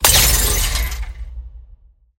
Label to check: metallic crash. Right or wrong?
Right